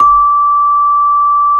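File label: CELE MID, percussion; AKAI S-Series CD-ROM Sound Library VOL-8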